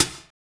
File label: CYBER KIT, DRUMS